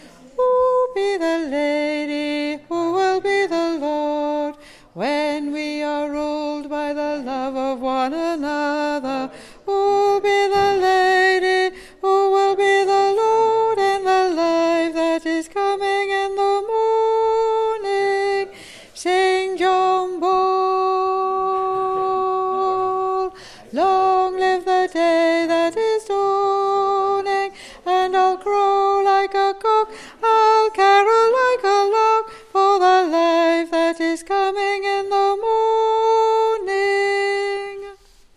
Alto

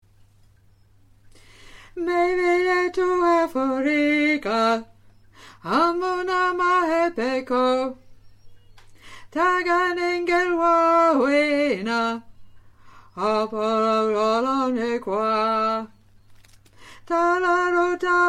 Alto

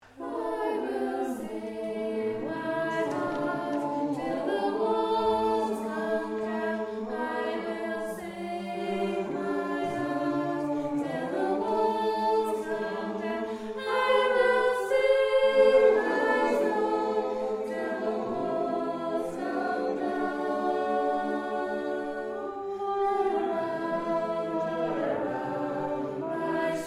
All Hum

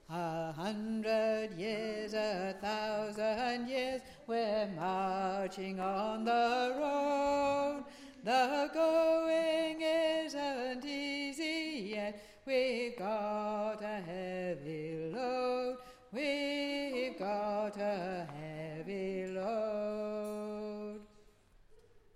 Tune Tenor; Chartist Anthem

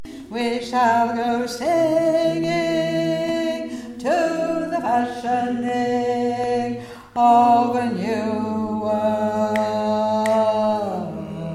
Bass

